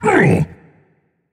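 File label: non-verbal ouch; smite; atlas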